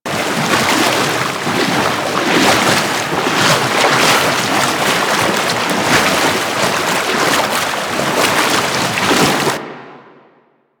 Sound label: Knee Slide Loop Water